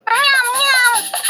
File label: cute meow meow